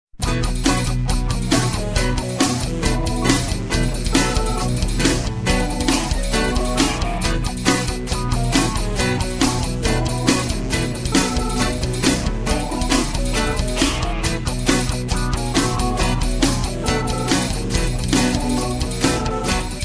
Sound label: Arabic Ringtones